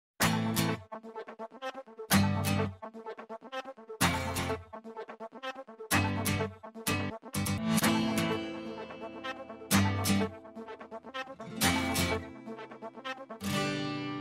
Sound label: guitar